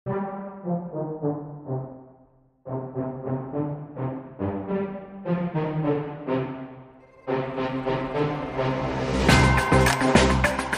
Beefy Brass

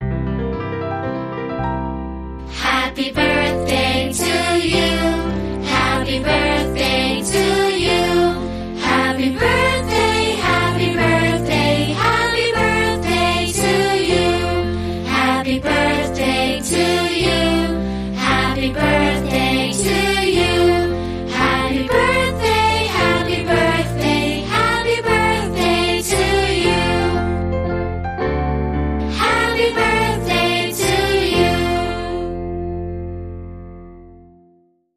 Children Vocal Happy Birthday

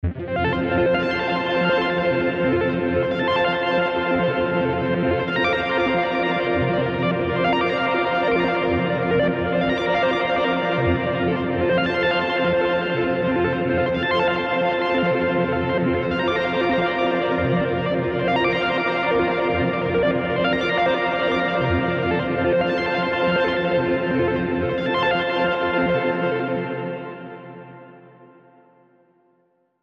Clouds Bright Arp Pulse

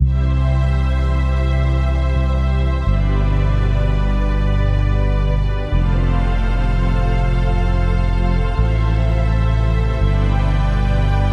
No Drums No Melody

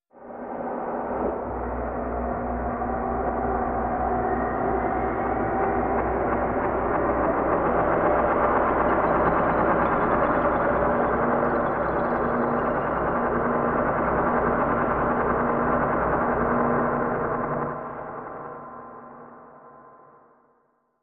Noise

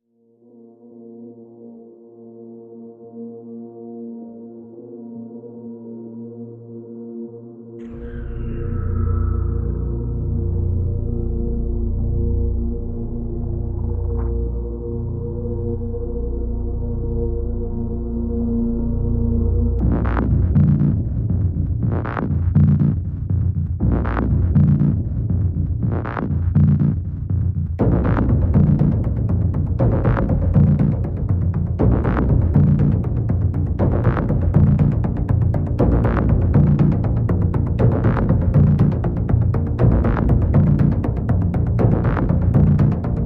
Pads and Pulses

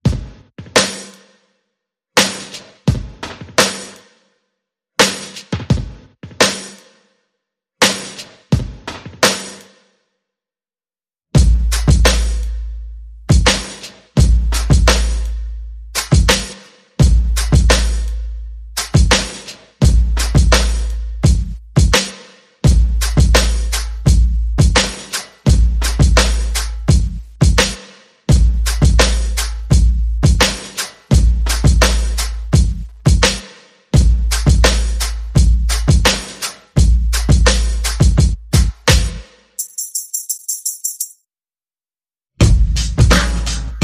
Perc and FX Only

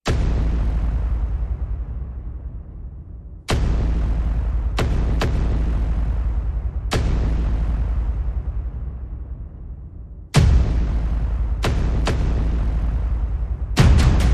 Percussion and FX

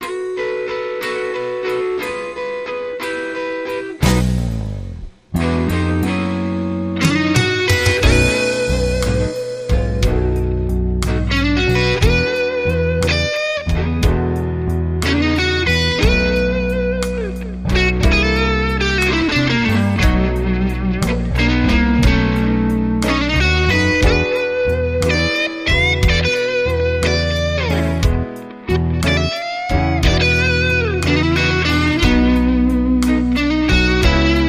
Way Too Late Blues

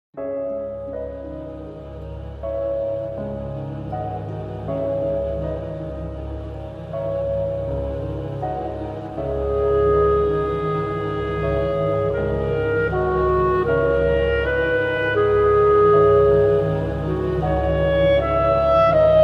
East West Short B Melody With Clarinet & Violin

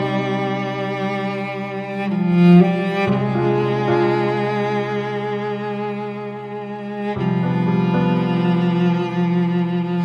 No Percussion

